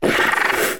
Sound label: Compy chortle